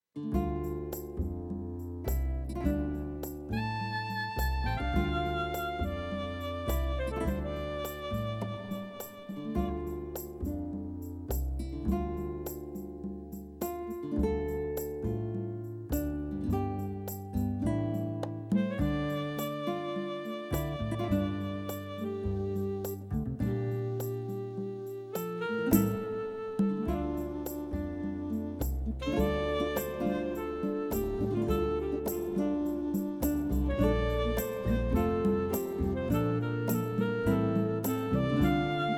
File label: Backing track